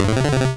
couch green

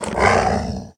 Wolf Growl; The Battle for Wesnoth

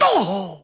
Racoon grunt

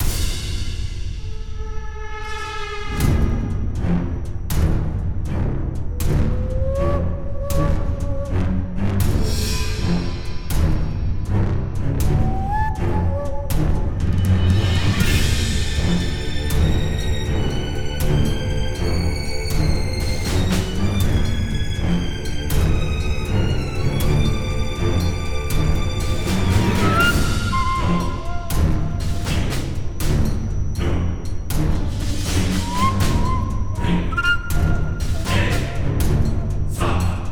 Boss BGM Cheater; sinner sacrifice for redemption

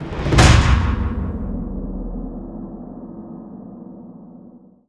whoosh; Stranglehold; PS3; Xbox 360

